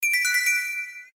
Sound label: Sweet Sms